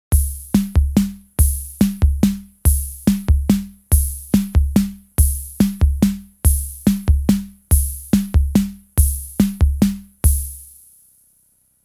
RHYTHM WALTZ ROCK

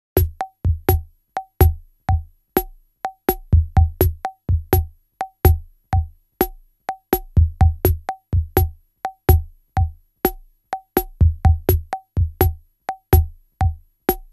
RHYTHM REGGAE

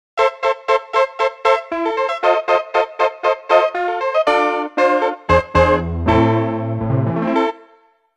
Honky Tonk Piano

